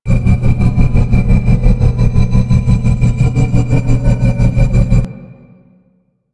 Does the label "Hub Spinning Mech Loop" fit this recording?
Yes